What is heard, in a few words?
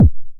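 909 BD tape, kick drum; Goldbaby Tape808 & Tape909; The Tape 909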